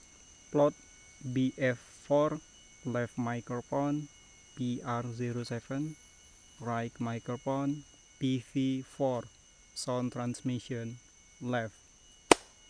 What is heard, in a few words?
birds; ecoSound-web